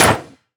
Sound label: Hit Metal; Damage